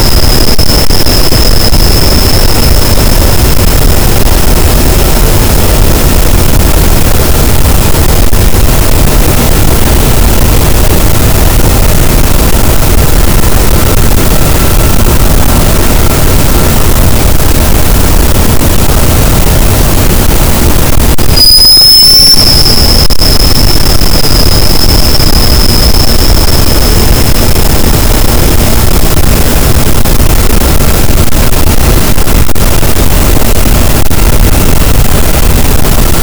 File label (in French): cachalot, gaussian noise; Physeter Macrocephalus